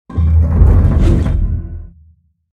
boss rage; astro Legends Lyra and Erion Desktop; Foxium

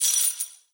special cluster; Skillzgaming; gems odyssey

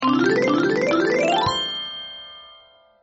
huge win popup; Skillzgaming; fruit Blast 92 Desktop

